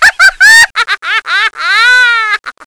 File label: fem laugh; lmctf